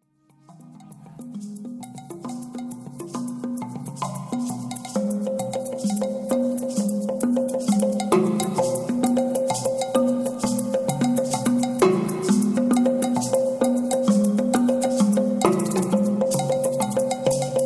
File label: Tone Drum